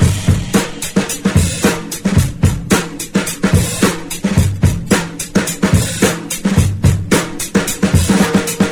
low end massive, funky drum loops

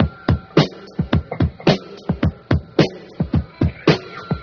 screechy guitars, drum loops boombap

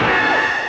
ringy

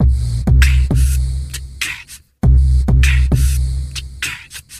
heecachuk, Beatbox, Drum loops

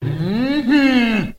mmm hmm; ooh ahh

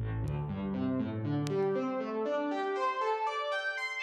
rising, Electronic Loops

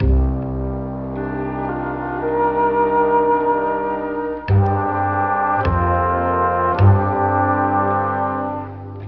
strings flute, Sad; Loops

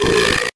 burp short

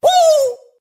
woo

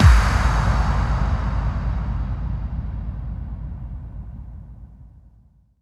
electric explosion; Crash Bang Boom

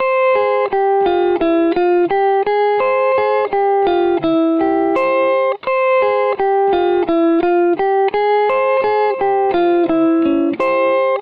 guitar, Loops

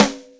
dryer, snares real

